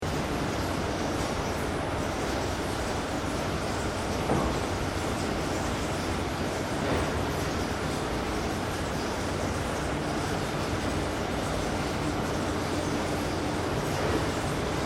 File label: Industrial Conveyor Truck Loader Loop; Various industrial machines; Machinery; Sound Effects:Machines; Foundation3